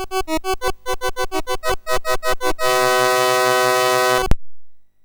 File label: sid MOS6581R3; Ball Trap BASIC